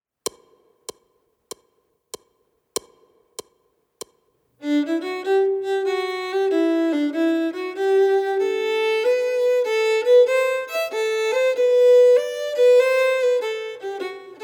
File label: Steady 096bpm